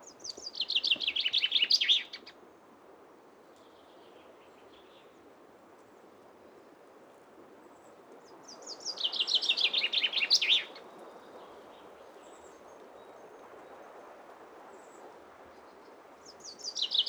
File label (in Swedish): Bofinken i Oskarshamn Mono, Naturinspelningar